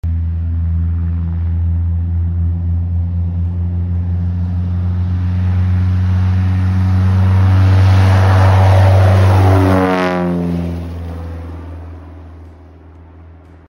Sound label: Cessna plane; هواپیما سسنا